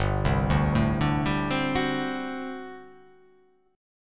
G Major 7th Suspended 2nd Suspended 4th G down

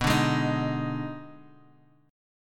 Eb Major 7th Suspended 4th Sharp 5th B

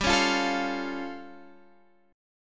A Augmented 7th G

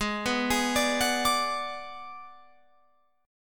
Ab Minor 7th Flat 5th Ab, down, Guitar, Open F; Abm7b5; arpeggiated

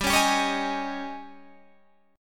G sharp Major 7th Suspended 4th, G

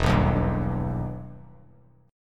E Minor Major 7th E down; EmM7 strummed

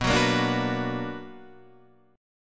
B Minor Major 7th, BmM7 chord; strummed; JGuitar

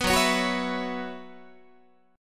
F sharp 7th Suspended 4th, Guitar; strummed; 14,14,16,16,15,15; F#7sus4 Chord

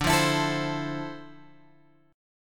D Minor 9th; Dm9 Chord {10 8 X 9 10 8} strummed

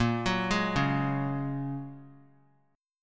A sharp Minor 7th Sharp 5th A sharp, 3C,3F,3A,3D; arpeggiated

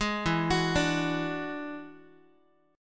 E 9th, Guitar; arpeggiated; chord; JGuitar

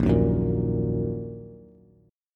Bb Suspended 4th Sharp 5th Bb down Bass; strummed

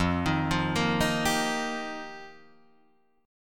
F 7th Suspended 2nd Suspended 4th, Guitar Drop D

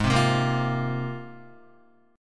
Ab 6th Add 9th Ab, Guitar; strummed; JGuitar

